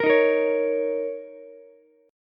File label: A sharp Minor Double Flat 5th A sharp, Ukulele; A#mbb5 strummed; JGuitar